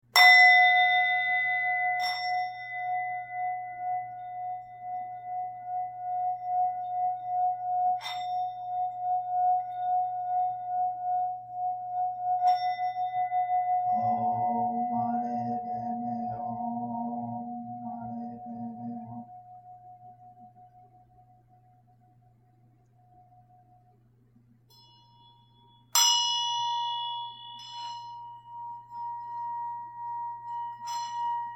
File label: singing Bowl ghanta